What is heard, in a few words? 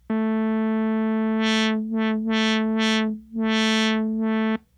Nord Lead 4 filter